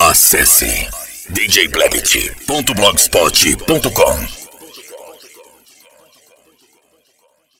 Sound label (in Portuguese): funk sertanejo